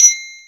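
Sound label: R8-PRC, R8 percussion; E-Lab; X Static Goldmine 1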